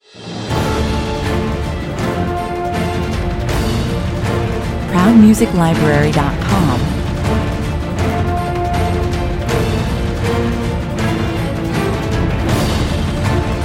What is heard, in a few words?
no choir